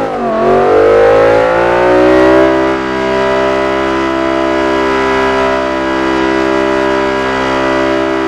second, vehicles; bmwm5e60

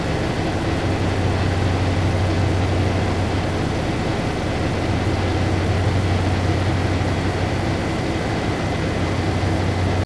equip hum, machines; gmod

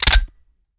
reload empty charge; gmod

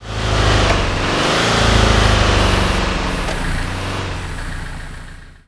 throttle off, vehicles; gmod; tdmcars; gtav; camper

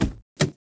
door open, vehicles

